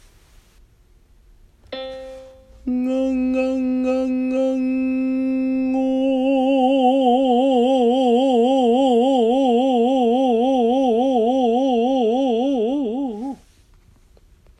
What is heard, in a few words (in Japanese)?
クレッシェンド 女性